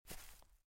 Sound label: SFX step grass; Out of Reach: Treasure Royale